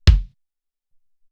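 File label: Smak Kick 4, Alesis SR-18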